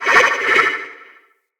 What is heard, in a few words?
Corrupted Slug Vocal Pain